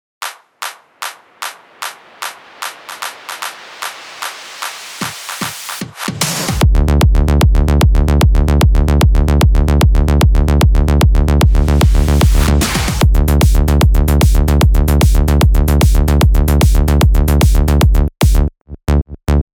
drums

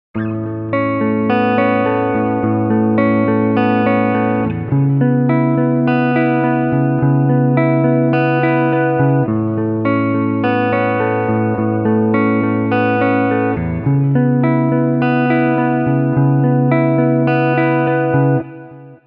Guitar

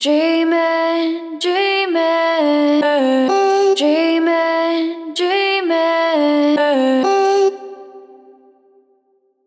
Vocal Chop 128 BPM A Min; Cymatics

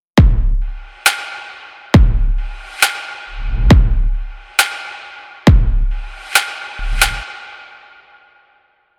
Kick Snare

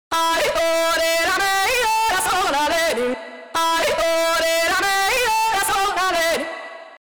Only Overdrive

